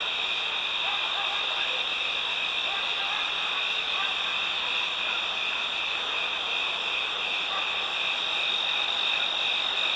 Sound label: bird